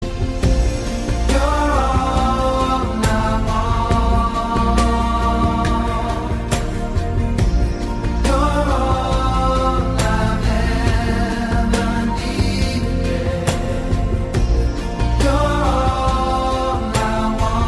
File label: Chorus